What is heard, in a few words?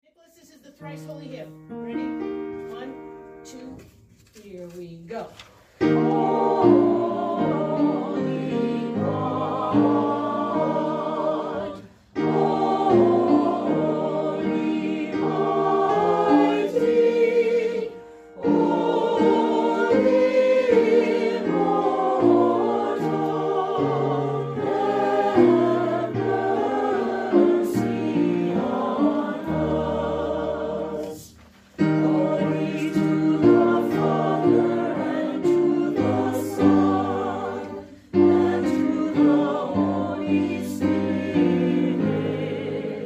the thrice holy hymn